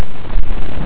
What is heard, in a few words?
volcano